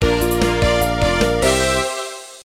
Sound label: LEVEL START; SHOOTA DELIC